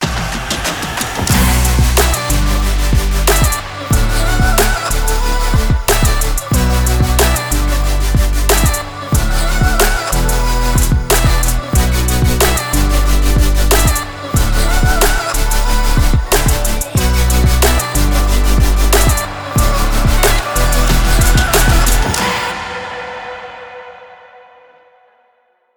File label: the future bass logo